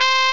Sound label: Trumpet (C4); X-Wing; X-Wing Patches